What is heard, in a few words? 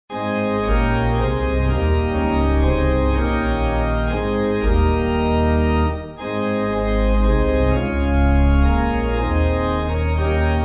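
Mendon Pipe, Pipe Organ; Come Gracious Spirit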